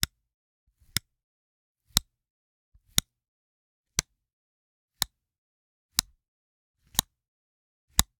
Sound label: Magnetic Clasp, embedded, cardboard, dull, snap, closed, various; Eiravaein Works; Vaeyan IV